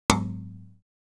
Window being hit by random object

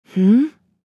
Female surprised moan what

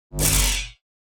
Lightsaber sword impact; Sci-Fi; Energy

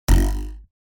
Cartoon twang ruler wobbling; boing

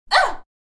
Woman pain ohh

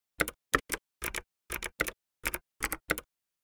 Optical keyboard typing slow

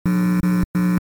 Electrical buzzing burst speaker glitch short

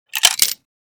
Casino game slot machine insert coin coins

